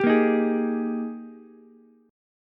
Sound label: Ab Major 7th Suspended 2nd Ab Ukulele; AbM7sus2; strummed; chordshape; JGuitar